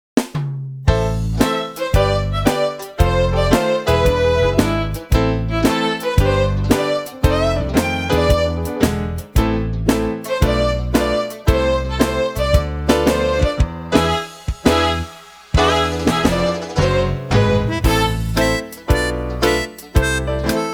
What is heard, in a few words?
Cowboy Shuffle